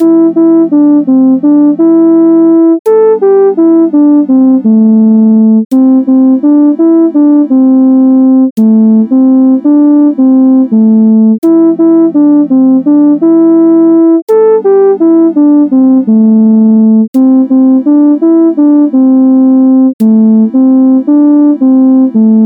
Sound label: hook melody guide 84bpm